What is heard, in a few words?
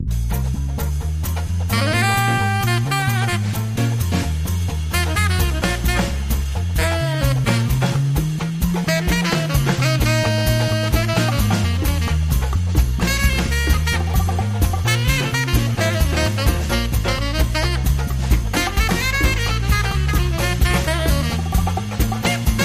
Sax Solo